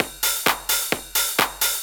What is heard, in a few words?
drum 130, techno trance drumloops; Techno Trance Essentials